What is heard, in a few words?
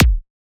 Kick